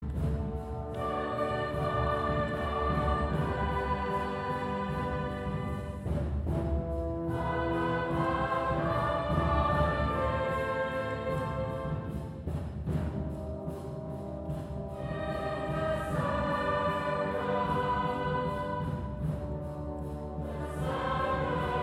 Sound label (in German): Chor Orchester; musikdarbietungen bei der kunstnacht